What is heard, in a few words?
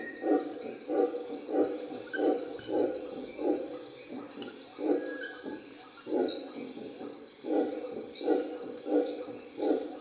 bolivian red howler alouatta sara; revuna obezyany